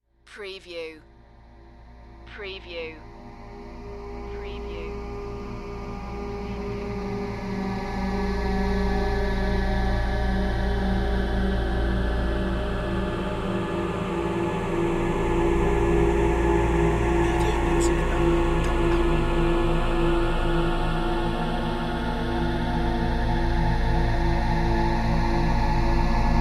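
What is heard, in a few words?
Production Element Bed Synth; Sound Effects Bible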